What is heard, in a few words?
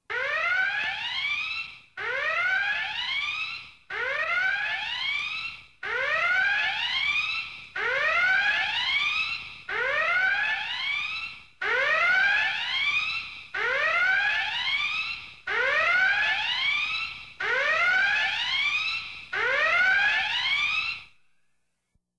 red alert; TAS